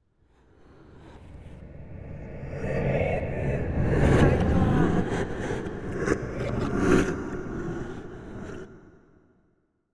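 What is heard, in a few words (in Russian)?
страшное дыхание молодого человека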